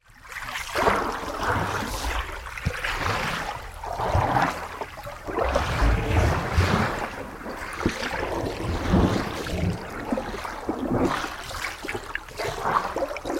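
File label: sounds of a drowning person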